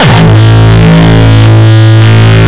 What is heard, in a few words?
Longkick, kicks